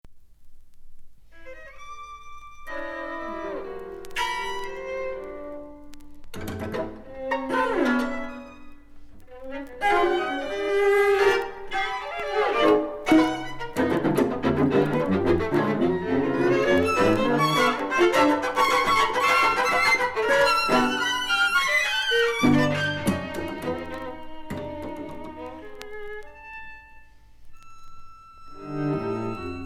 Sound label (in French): quatuor a cordes